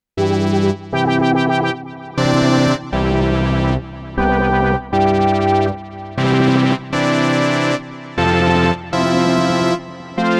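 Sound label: KORG Odds Stochastic Virtual Analog; Stochastc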